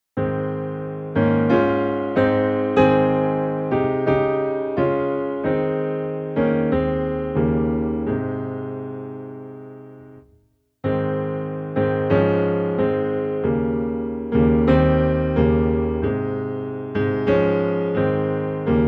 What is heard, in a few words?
Piano Low in A Flat